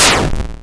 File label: shoot; HighNoonRoulette; Exobyt